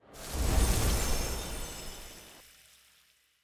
Whoosh Transition; bloom minigame

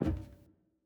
Footstep Metal Stairs

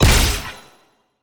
attack dash heavy; bloom minigame

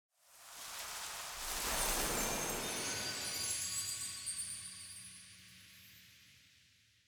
Cutscene Intro; bloom minigame

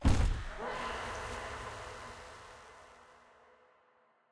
library puzzle open; lissandra

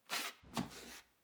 Footstep Wood Rotate

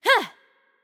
Attack Light; bloom minigame

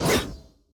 Attack Light; bloom minigame

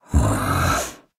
Nonverbal Annoyed; Ornn

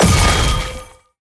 attack jump heavy; bloom minigame

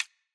Safe Tick

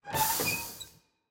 Pipe Flip

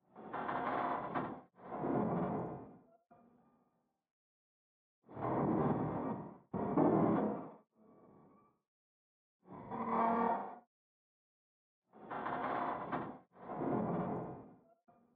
Stairs Creak

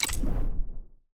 UI Click Quit; bloom minigame

